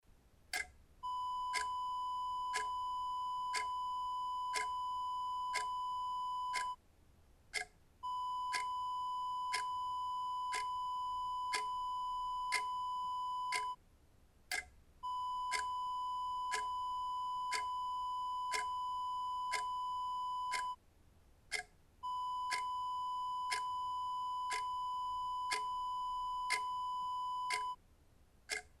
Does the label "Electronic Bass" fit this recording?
No